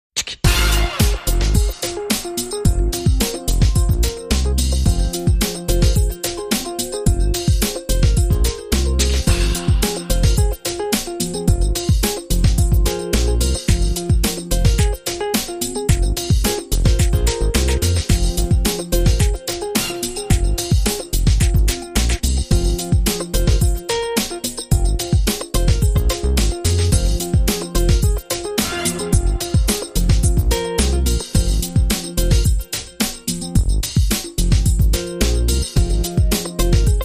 Chica Break Bed

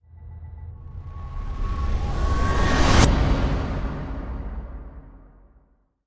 Rev Shotgun

